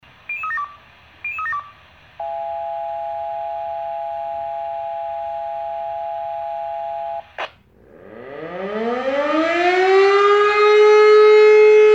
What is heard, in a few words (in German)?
sirenenalarm